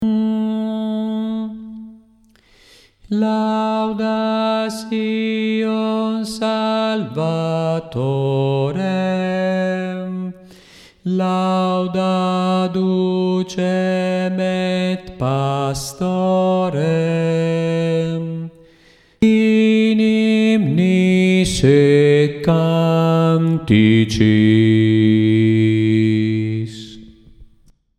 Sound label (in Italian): bassi